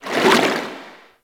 Shark Tail Move Swim; Warframe; Grineer Ocean Shark

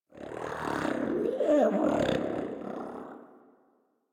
Catbrow Combat Vocal Idle; Warframe

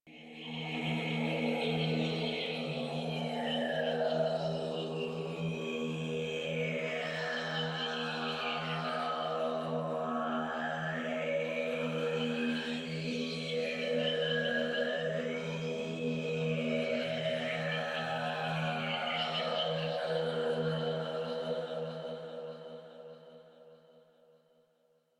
Choir; Warframe; DE; Sentients

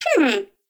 baby vocal; Nokko; Warframe; Nokko Colony; Find Nokkos

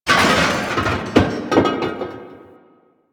desktop metal destroy; ambience; Warframe; Entrati

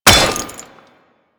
Staff Stomp; Royal Guard; Foley

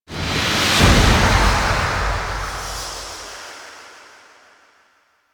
Cinematic Battle End; Warframe; Ambience; Hub; Tenno Junction Relay

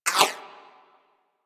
Laser Whizzby; Warframe; Weapons

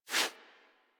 Foley Idle Paw Swipe Quick; Enemies; Kubrow; Warframe

